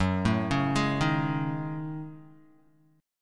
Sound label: F sharp Minor 6th Add 9th, Guitar; arpeggiated; JGuitar